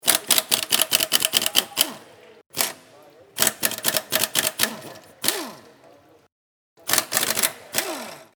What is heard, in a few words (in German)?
Autowerkstatt Pneu